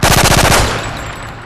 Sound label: Auto Pistol; Mw3